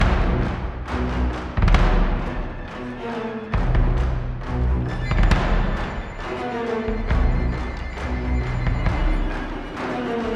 Zombie Chase Music; bramble the mountain king; ps4 ps5 switch windows xbox one xbox series xs

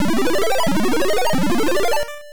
Level Clear; Alter Ego; NES

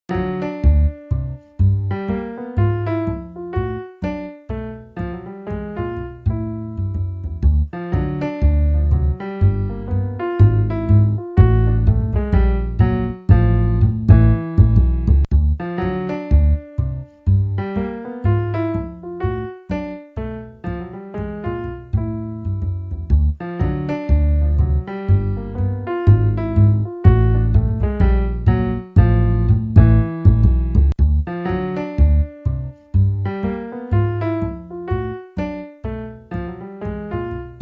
Smokey Lounge Jazz Kit; lobotomy corporation; family computer; Smokey Lounge Jazz Combo

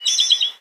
bird random

